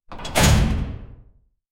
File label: short game sound effect, slam